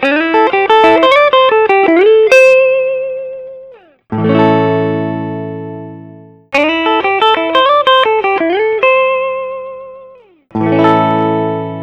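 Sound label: collings soco deluxe tobacco sunburst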